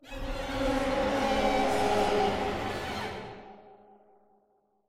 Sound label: Music Stingers Stinger; Hunted: The Demon's Forge; x360